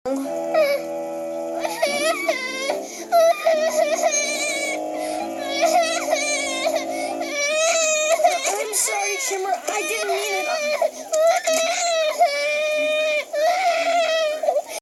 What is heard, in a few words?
crying; shimmer